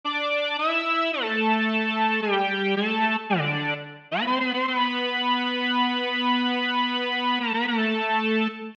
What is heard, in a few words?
Pad; Back Together